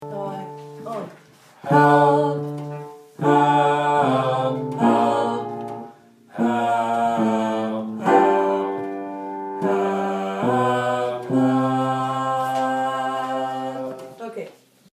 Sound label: Bass